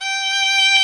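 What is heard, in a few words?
viola and violin, string quartet; 90s Sample CDs; Club-50 - Foundations Roland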